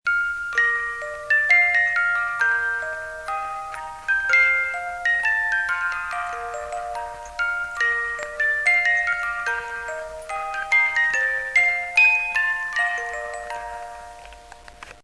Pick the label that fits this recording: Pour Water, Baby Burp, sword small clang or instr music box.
instr music box